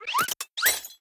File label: crush Jar; fruits